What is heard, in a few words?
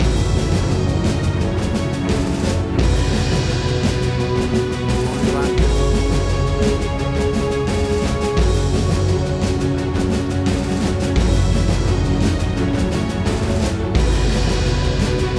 Big Action Movie Trailer Sound